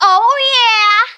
win; donut sticker

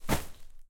ball in goal; The Smurfs - Penalty Shout-Out; NextlevelGames

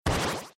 collectibles hp; Adventure Time - Break The Worm; ThrillGames

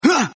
hit; leo

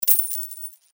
Coins Dropped; The Adventures of Puss In Boots - Catch The Thief; NextlevelGames

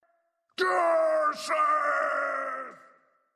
Enemy Run Monkey; The Powerpuff Girls - Mojo Mayhem; NextlevelGames

